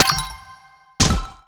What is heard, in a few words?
Menu Spatula Unlock